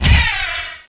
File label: shoot, paintball